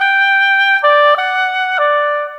Oboe, Orchestral, Rock Pop; Sonic Foundry (Sony Creative Software); Orchestral Series VOL-4 - Rock and Pop

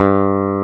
W BASS G2; AKAI S-Series CD-ROM Sound Library VOL-2; MX.BASS

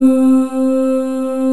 C4 MALE

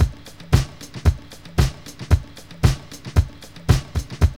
DISCO LOOP; Zero-G; Funky Elements

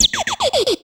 FX Dance; Sound & Vision - Gigapack II

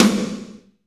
SNR, Snares, Roland

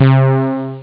bright; jazz jackrabbit 1; M8

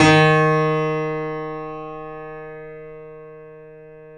D#, The Piano; InVision Interactive

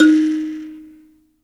PLK BUZZ, Buzz Kalimba, Africa

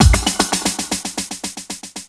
Dub Drum; Sonic Foundry (Sony Creative Software); Ambient Grooves; Dub Hits

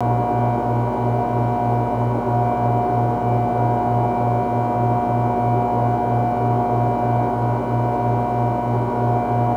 room tone

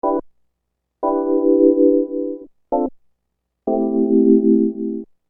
Keyboard; Sonic Foundry (Sony Creative Software); Infinite Soul VOL-1

